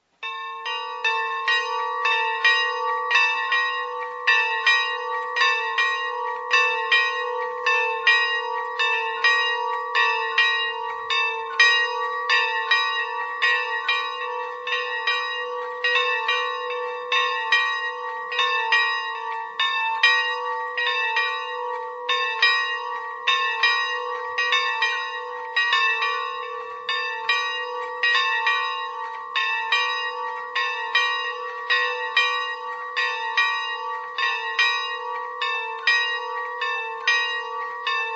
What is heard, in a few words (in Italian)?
campane; richinvelda